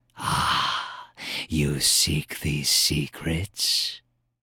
Spellbook Open; Corvus

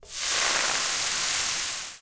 UI Fireworks Fuse

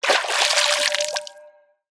water tone

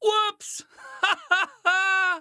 snowfall; fusty snowman taunt

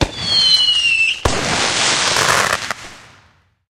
UI Fireworks Pop

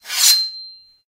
logo tinkle

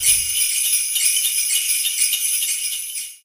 UI Christmas Sleigh; BTD6

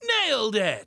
victory; fusty snowman; sculpture

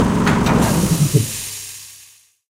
workshop motor off

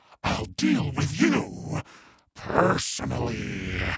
stomp; matchup biker

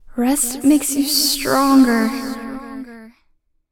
Upgrade; Psi Dreamstate

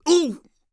Damage; Quincy

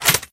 UI Chest Latch

